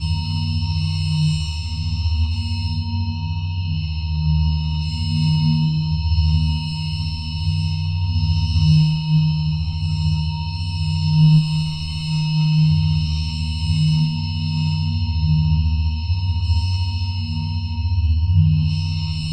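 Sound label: futuristic sci fi ambiance, beeps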